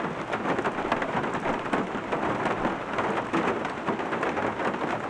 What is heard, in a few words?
rain; Mafie 1